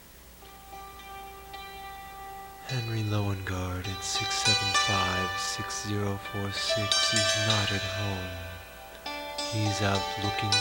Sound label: Crystals